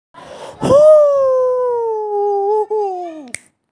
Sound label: applause